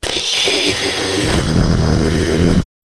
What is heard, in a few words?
jingle